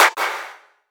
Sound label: Claps; Vengeance essential club sounds-3